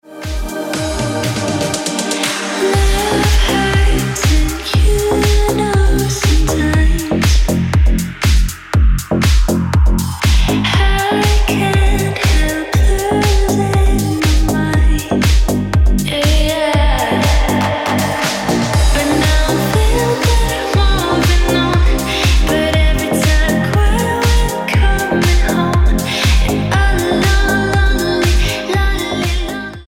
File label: Ringtone